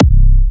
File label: Slobber, Big Room Kicks